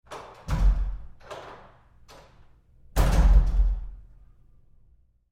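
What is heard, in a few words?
Door Bathroom Stall Handling Roomy Medium Short; Bathroom Sound Effects; Big Room Sound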